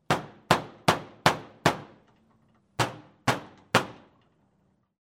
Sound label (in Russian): suchat v metallicheskuju dver; Звук стука в дверь